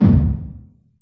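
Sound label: large room 909, reverb; TR 909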